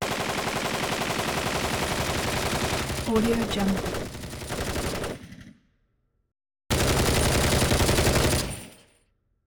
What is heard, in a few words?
warfare rifles